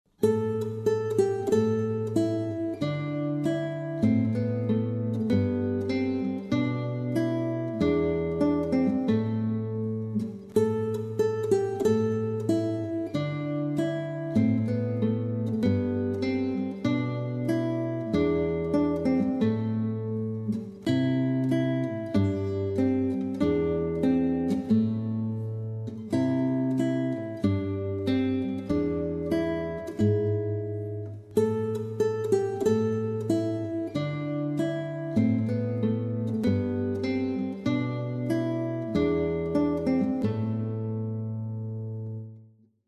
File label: Guitar